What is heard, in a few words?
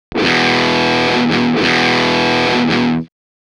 SYNTHETIC Riff WITH distortion, electric guitar; electric guitar synthesis